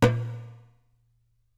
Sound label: darbuka note